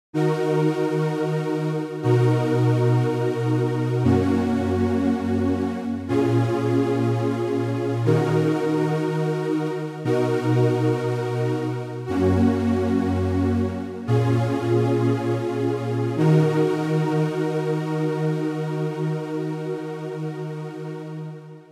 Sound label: pad